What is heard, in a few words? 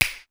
wide fngr, perc; sr16 perc